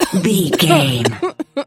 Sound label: voice female cough